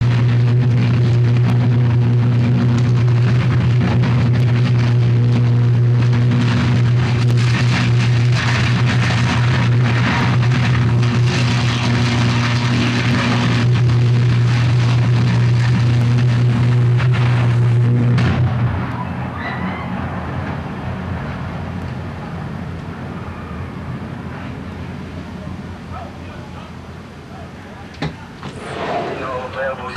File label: Xfrm Blast